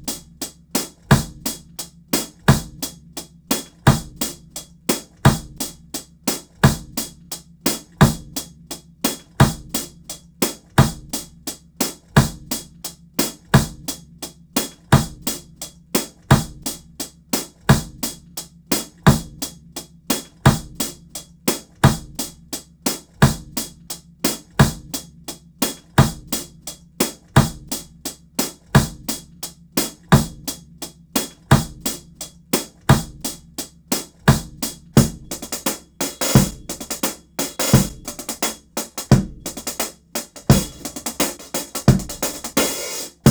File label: Drums Overhead